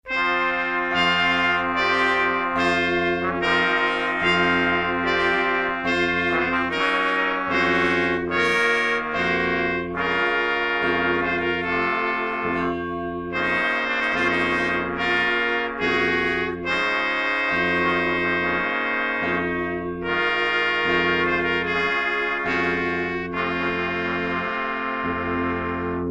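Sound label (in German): Bläsermusik Aquarell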